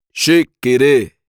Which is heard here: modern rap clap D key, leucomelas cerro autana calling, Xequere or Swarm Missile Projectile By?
Xequere